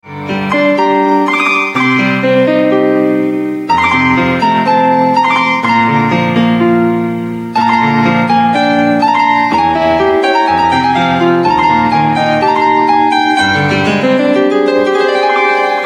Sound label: piano